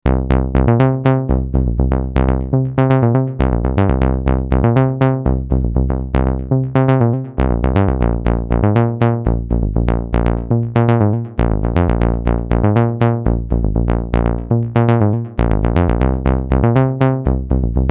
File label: bass arp